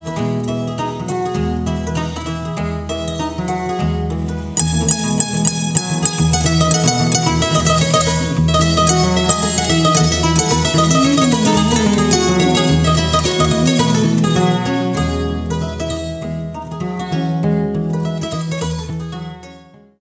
KORA SOLO

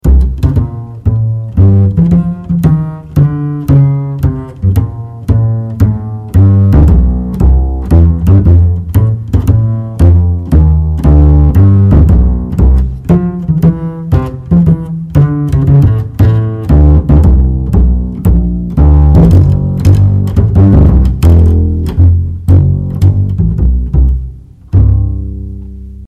bass, jazz

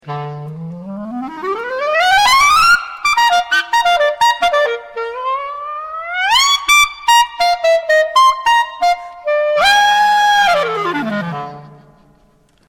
clarinet, jazz

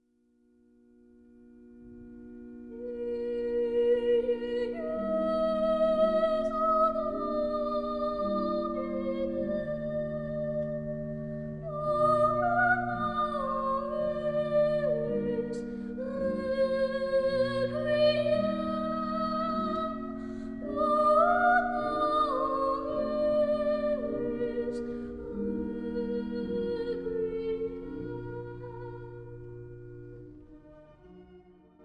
soprano, coral

